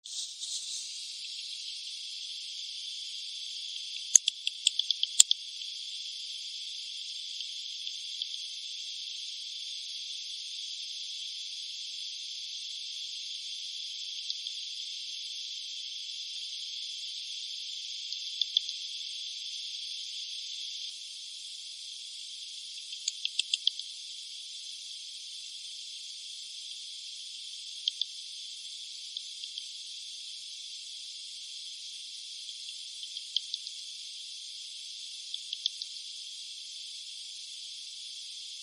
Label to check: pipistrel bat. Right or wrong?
Right